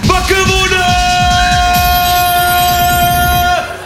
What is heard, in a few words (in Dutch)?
WAKKER WORDEN KORT; kermis geluiden